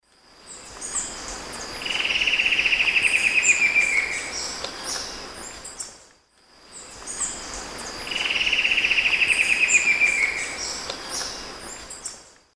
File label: Dendrocincla fuliginosa, song; S. America; Eastern Lowlands